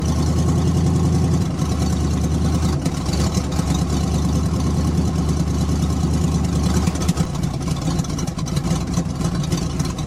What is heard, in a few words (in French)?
Vieille Camionnette